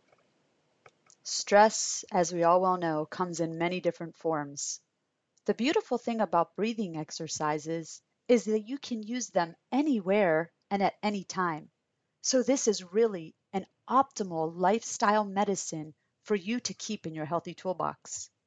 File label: Breathing